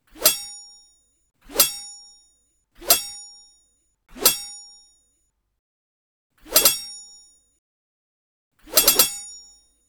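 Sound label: Throwing Projectile Blades Hit with Ringout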